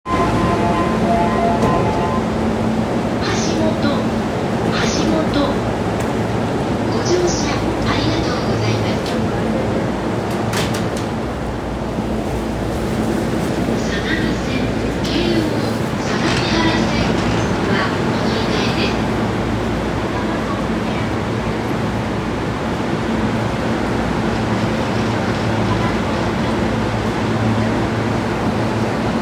JR横浜線橋本北, train sounds

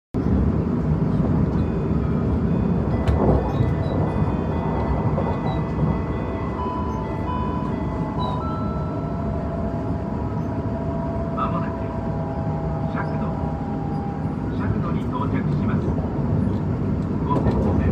大阪線尺土東, train sounds; 近鉄; 近鉄大阪線尺土東

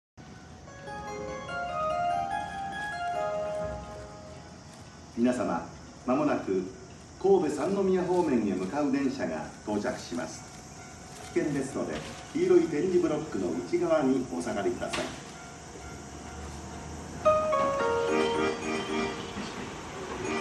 阪急神戸線六甲西

